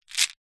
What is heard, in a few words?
candy sweets mint lozenges in plastic tub short sharp movement; food